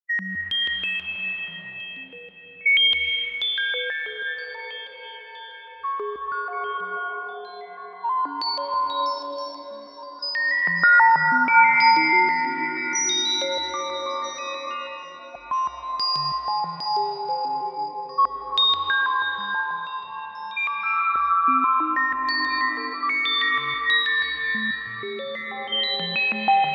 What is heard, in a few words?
RING SCIFI, Ring Tone, Stereo; HTC; E-Club Ring Tone Package 1